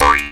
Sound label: D JAW, Primitive Instruments; Big Fish Audio - Didgeridoo and Other Primitive Instruments; JAW SIMPLE